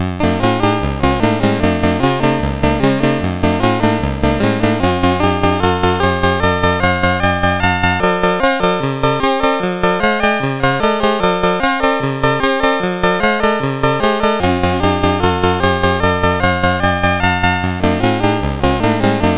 Willow Pattern, CSG8580R5

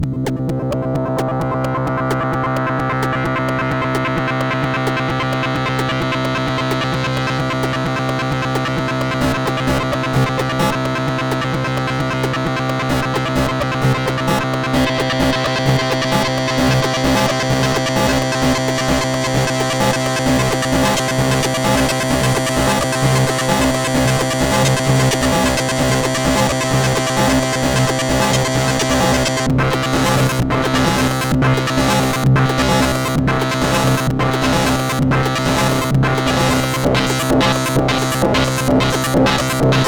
Pickup, CSG8580R5

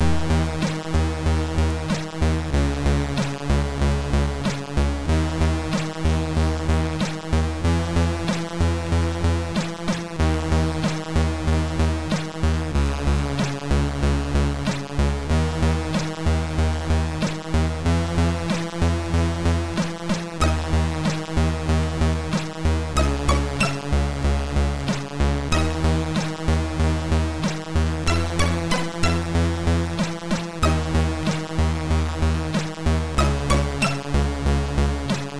Sad, sid MOS6581R2

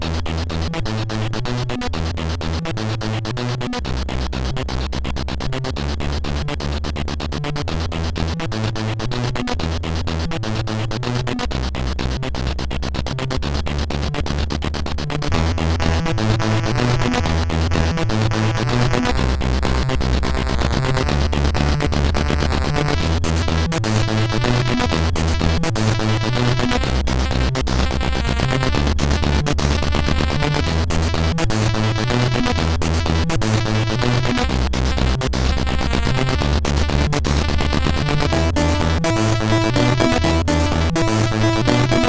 Amiga version sid CSG8580R5

